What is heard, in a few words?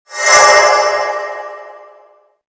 cleanse sfx; zombie plague